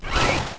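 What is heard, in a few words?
opens wing; dmc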